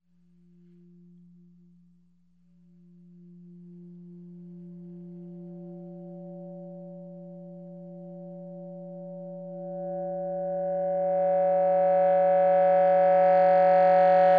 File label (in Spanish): Morphing